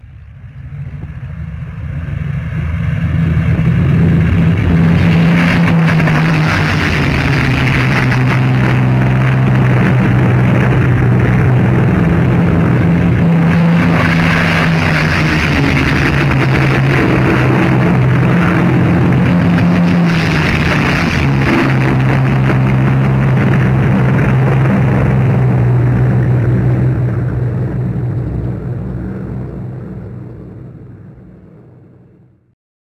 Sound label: Several Spitfires take off